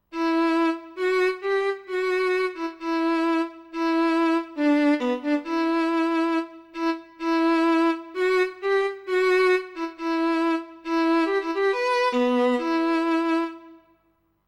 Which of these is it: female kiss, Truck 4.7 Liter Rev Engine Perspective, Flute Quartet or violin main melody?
violin main melody